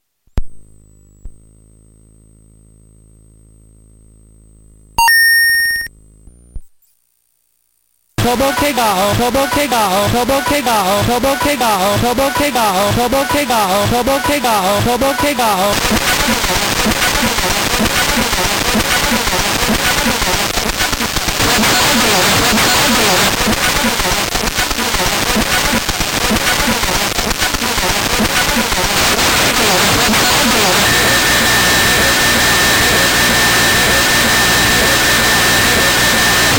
wave circuit bent gameboy noise

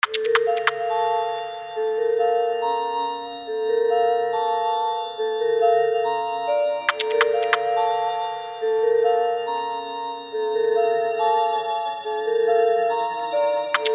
riddim; weeklybeats 2024

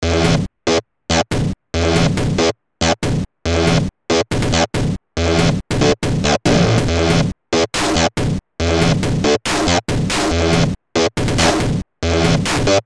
klaxon shuffle

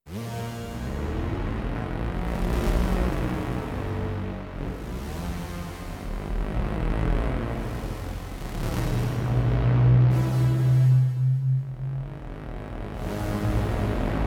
detuned trumpets; jerry cow; weeklybeats-2020

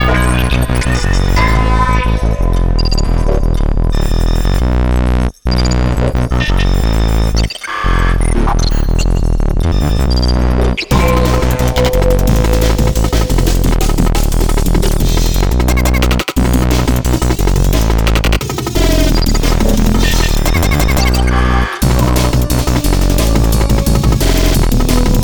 glitched jungle; weeklybeats 2024

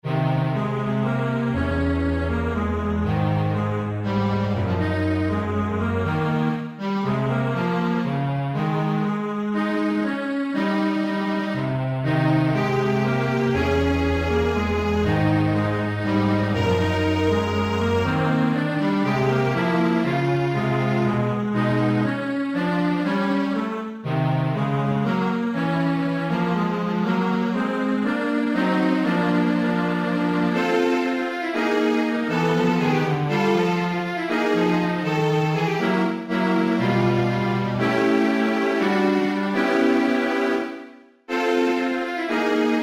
strings; weeklybeats 2024

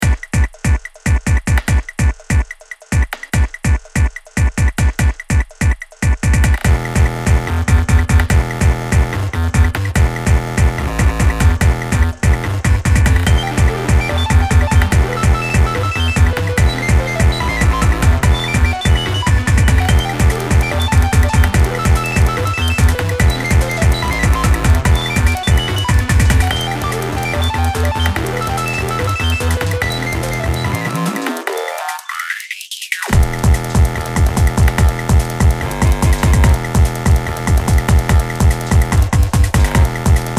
drum machine; weeklybeats 2026

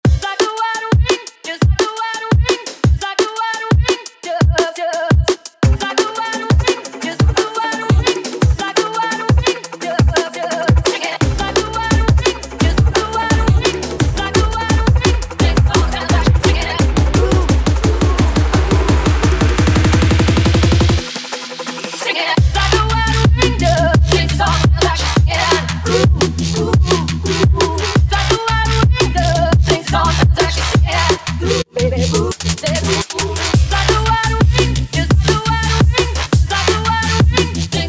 edge of drum and bass

